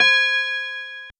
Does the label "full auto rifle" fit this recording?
No